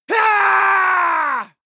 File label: male combat attack long